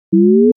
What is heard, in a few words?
Beeps tone